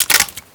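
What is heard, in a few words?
weapon foley striker reload shell; Call of Duty Modern Warfare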